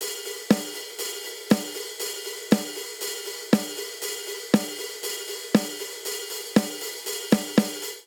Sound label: Classic Rock Snare & Hihat 119 bpm; 4 4; MIDI Clips; Ableton; Factory Packs; Drum Booth